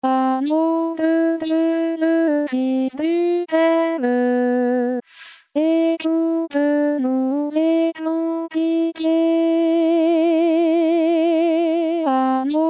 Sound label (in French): Agneau De Dieu, Alto; Sur Le Choral Wer Nur De Lieben